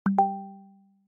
google chat notification sound

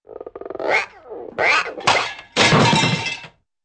stretches pop and junk clatter

